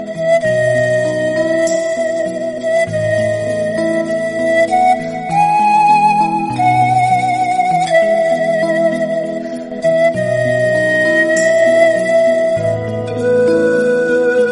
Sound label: sweet and soft instrumental